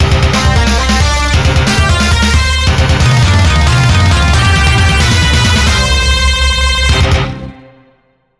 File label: victory; ambient; bgm